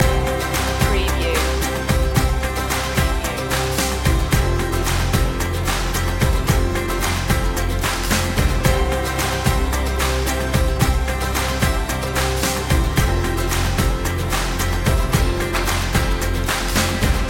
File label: happy to help loop